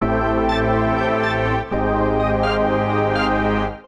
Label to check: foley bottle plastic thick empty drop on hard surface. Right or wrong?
Wrong